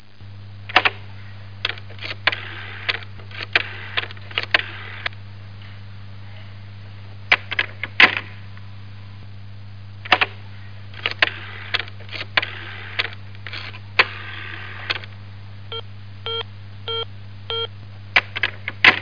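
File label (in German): wählen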